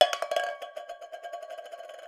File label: tin